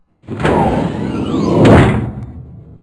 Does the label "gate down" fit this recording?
Yes